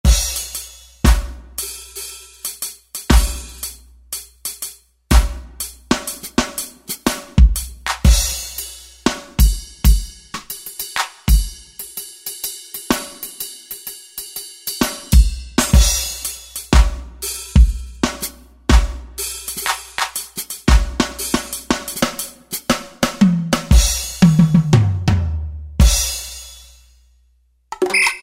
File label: Pop Up Kit, Alesis QS8; Factory Preset examples